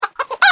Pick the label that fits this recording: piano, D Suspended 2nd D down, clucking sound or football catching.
clucking sound